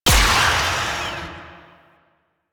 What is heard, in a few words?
Shot Medium; Weapons; Warframe; Duviri; Incarnon Adapters